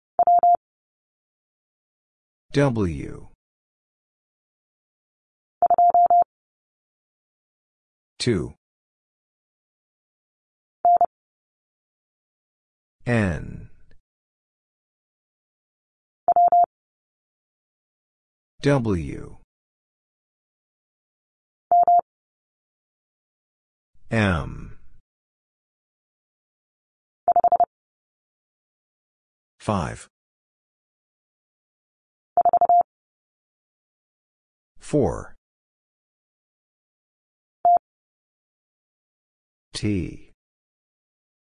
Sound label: Letter w Single Character 30wpm, morsecode; Lesson 19c